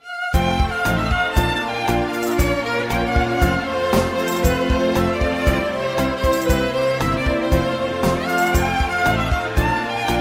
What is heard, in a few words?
TANGO, BULGARIAN